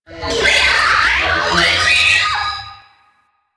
Sentient Ghost Caster Pain, Barks; Warframe; DE; Sentients; Sentient Eidolon Ghost Caster

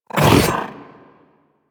Companion Melee Swipe

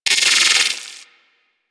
Arachnoid Micro Vocal Attack; Warframe; Corpus

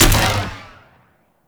Swarm Cannon Fire; Warframe; Weapons; Corpus

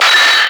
Screen Flicker; Warframe; Duviri; Easter Eggs

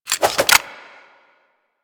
Pistol Reload; Kohm

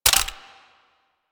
Gunblade Mech, Weapons

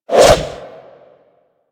Boomerang Throw; Warframe; Weapons

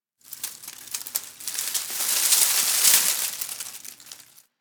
Derelict Tree Crackle; Warframe; Orokin Derelict

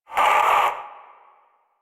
Possessed Red Veil Vocal Pain; Enemies; Warframe; Priest Quest

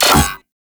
Infested Mercy Shotgun Alt Fire Error, Weapons

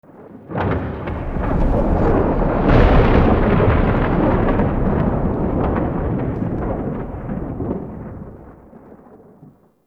Murmur Exterior Rolling Thunder G; Warframe; Ambience; Duviri

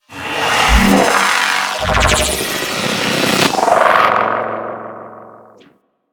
Idle Noble Power; Warframe; Necro

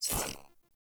UI Corpus Theme Dialog Close; DE; Warframe

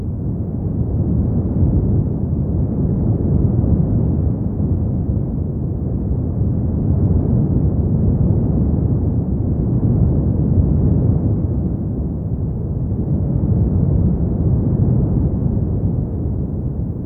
Asteroid Field Ship Close Loop; Warframe; Ambience; The War Within

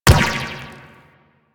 Ball Bounce Rock; Warframe; Missions; Tenno Ball

